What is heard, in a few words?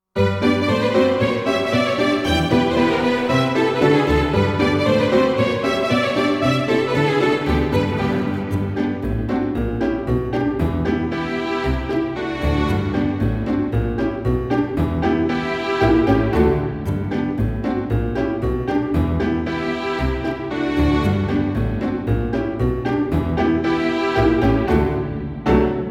polka minus trombone; album in trombone